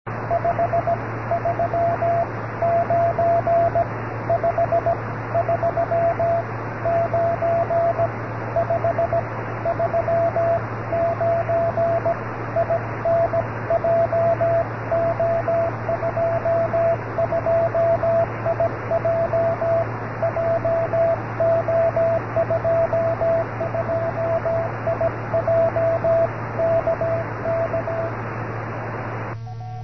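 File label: cross cw ssb qso, 432 MHz, amateur radio JO22IJ 725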